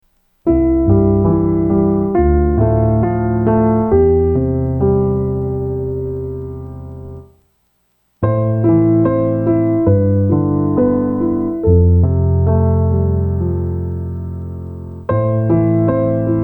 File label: piano, 70 bpm